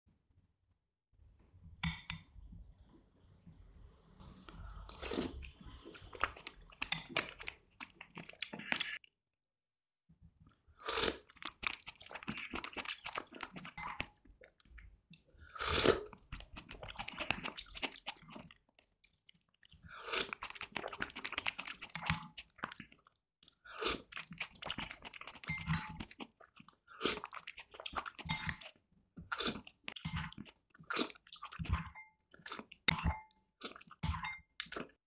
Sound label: eat soup